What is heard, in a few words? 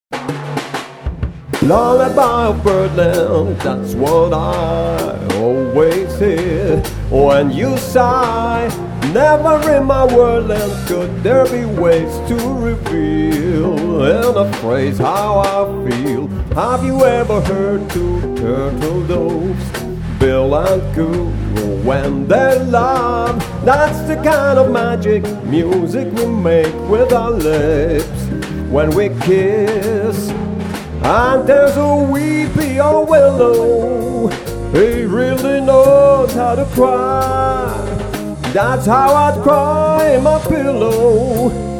Jazz